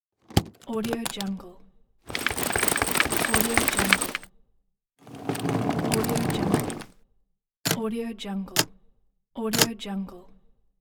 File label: briefcase